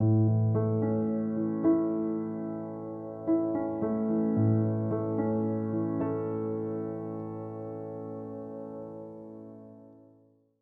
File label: piano loop 2 4; 4-4; piano pack